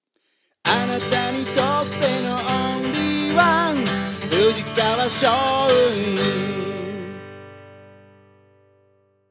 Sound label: soundlogo; fujikawa